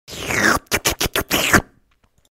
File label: licking sounds